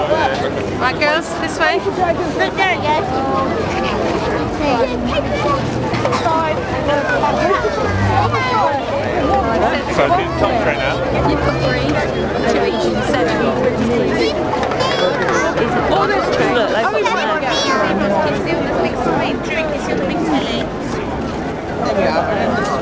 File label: ENV EXT Trafalger square crowd